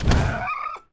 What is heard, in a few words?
spider soldier open